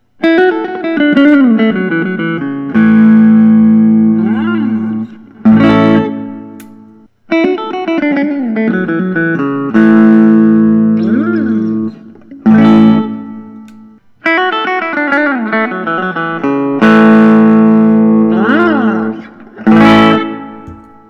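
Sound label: mcinturff carolina custom amber burst, guitars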